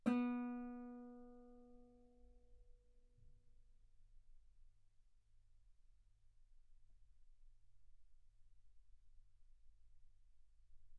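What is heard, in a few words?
Guitar ff B string B3; sul B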